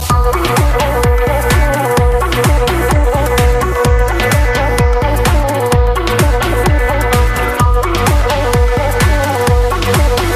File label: Romanian Ringtone